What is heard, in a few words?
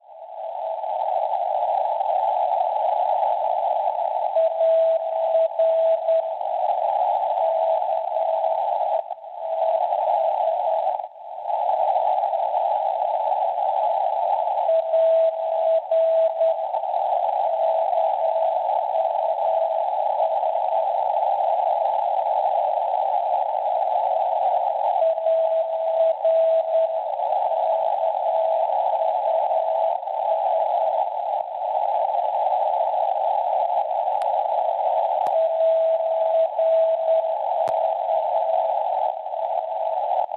NDB Aurillac Francia 343 kHz; AR; Schwarzsee